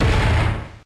Head Charge

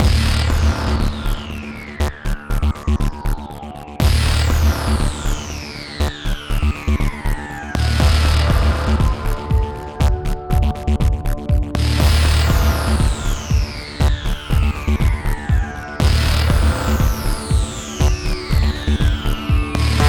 Tense; Selenon Rising

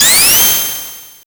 Spin Dash; Sonic WindowsZone

